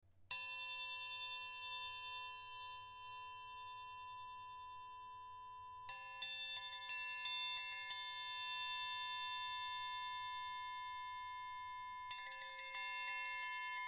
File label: triangle; instruments